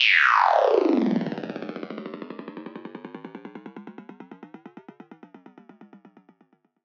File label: Downlifter; Cymatics - Astro FX 7